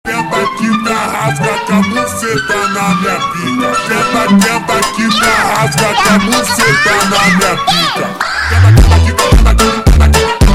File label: Funk Sped Up